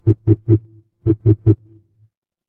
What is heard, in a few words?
ui timer